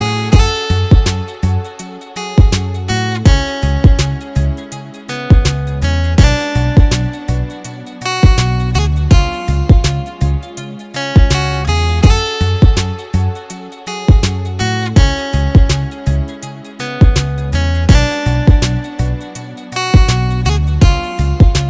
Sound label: Instrumental Ringtone